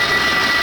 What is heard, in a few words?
Rocket Missile Loop; SFX Kit; Weapons